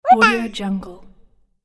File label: tiny voice "woo ba"